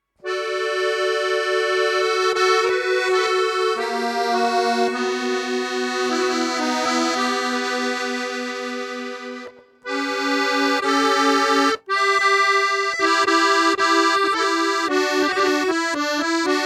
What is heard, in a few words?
Hungarian Folk Accordion